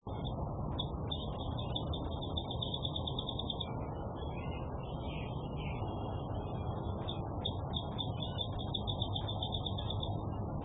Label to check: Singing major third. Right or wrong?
Wrong